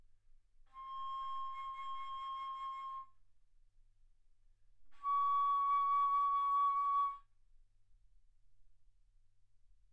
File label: Bass Flute C6 Db6; ff